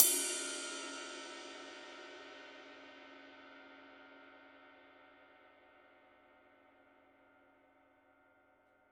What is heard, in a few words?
formula 602 classic sounds 24 medium ride body